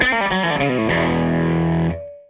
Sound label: Gretsch Guitar Loop